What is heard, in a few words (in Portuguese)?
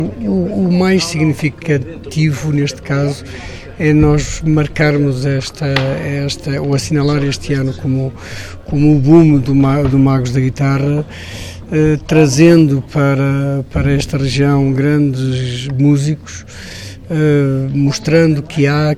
tango em guitarra; Uma viagem à música popular de referência do Rio da Prata, aos estilos do tango argentinos; centro cultural de macedo de cavaleiros